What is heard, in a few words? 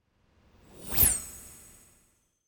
Space Ship By - High Toned Engine, Very Fast; Hollywood Edge; sound designer's toolkit v2